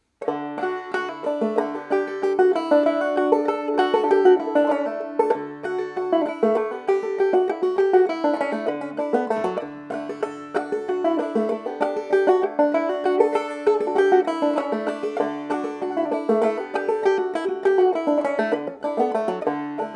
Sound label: Banjo D; Rocky Mountain Goat